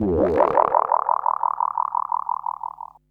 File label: bass delay fx; Drum&Bass; Instrument Samples; EZRollers